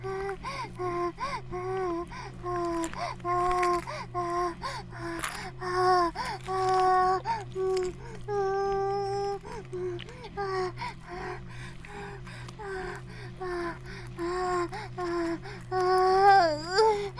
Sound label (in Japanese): バイブ放置